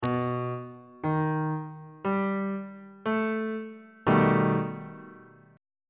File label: c flat augmented 7th chord